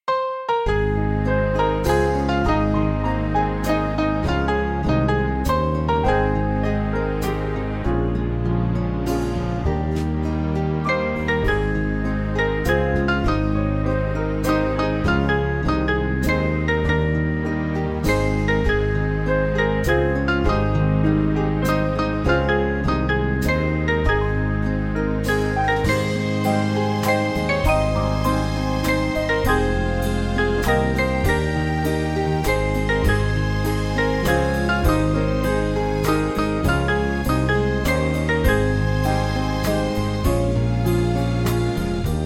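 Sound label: Band, Music Backing Files for Churches; Saviour More Than Life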